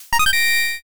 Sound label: stream start